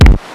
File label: k1, Kawai K1 Drum Machines; 300 Drum Machines